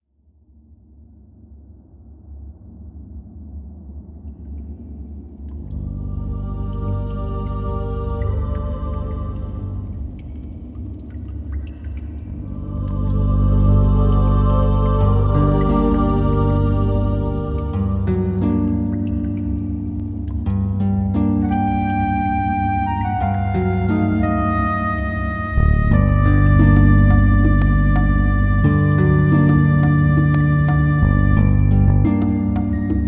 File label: Cave; Phoenotopia